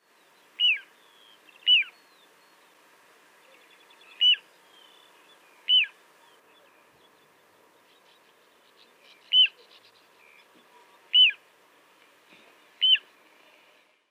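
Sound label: little ringed plover voice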